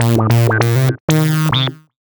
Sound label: lead (brass)